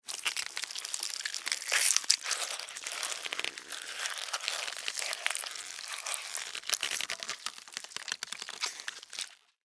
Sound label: Alien Chew Eat, Scifi, Alien, Chew, Eat, Slimy, Wet, Science, Fiction; Aliens; Blast Wave